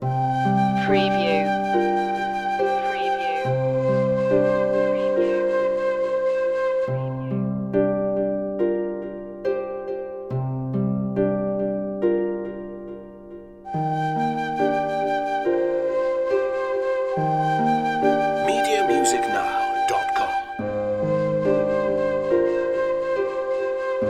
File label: harps and bamboo loop